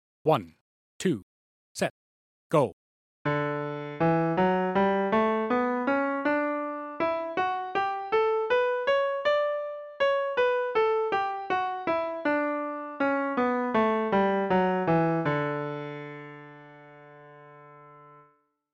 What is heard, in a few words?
A 2 octave high 80bpm